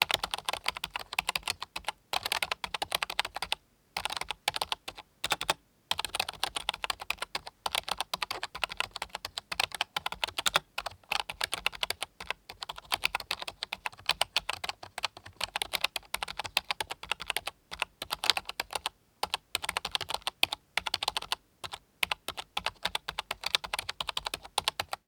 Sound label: mouse click single; ComputerDesktop